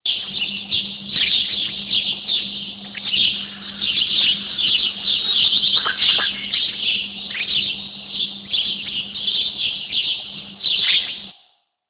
forest birds; animals

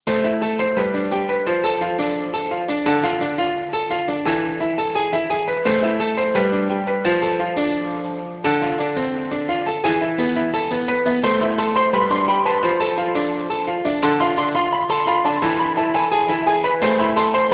ringtone newage

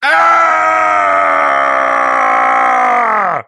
male scream; human

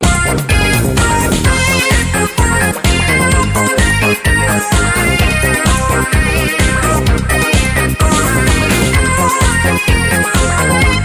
ringtone disco, instrumental

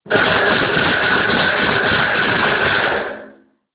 saw; industrial

